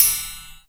spank, Triangle; Misc Percussion

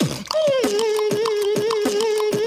hummer, Beatbox, Drum loops

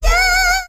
"yeah", sung

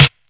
Snares Electro

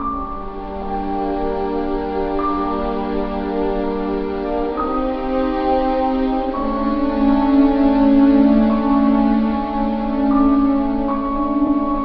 strings, Sad

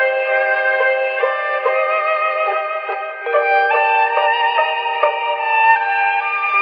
strings violence; Loops; Sad

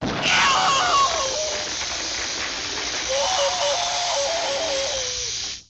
hot foot

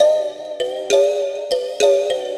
warped musicbox; Loops; Electronic

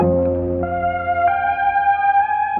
keys

